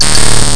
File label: section fail; cedru vax amas